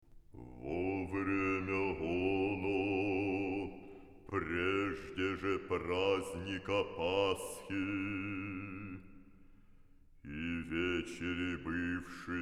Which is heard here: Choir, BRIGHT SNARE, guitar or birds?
Choir